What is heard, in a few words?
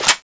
clipin; cstrike; weapons